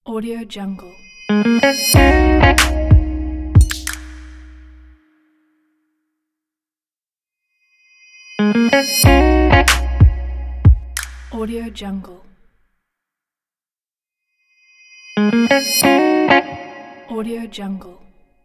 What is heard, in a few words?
modern guitar opening logo; centralperkproduction